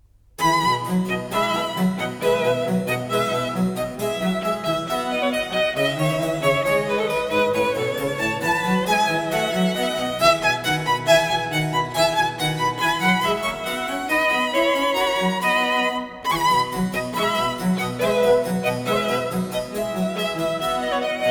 Trio Sonata For 2 Violins And Basso Continuo No.4 in E major; Vivaldi